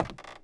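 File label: creak walk, footsteps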